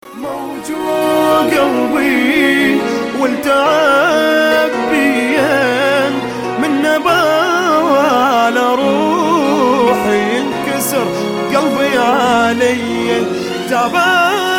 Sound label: Violin